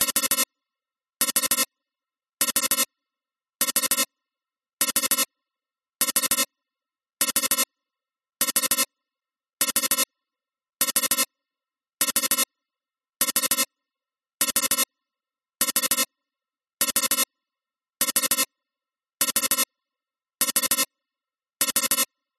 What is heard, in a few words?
science fiction alarm digital tone 3 cluster slow